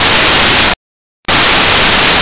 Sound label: ghost; MiniDash